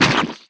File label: pumpkin smash; sfx